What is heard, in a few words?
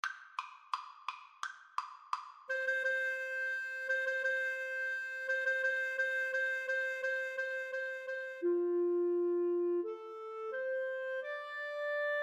Clarinet Duet